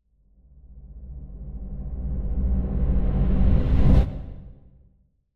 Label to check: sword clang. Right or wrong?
Wrong